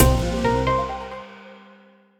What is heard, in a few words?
count up end; Sakura Secret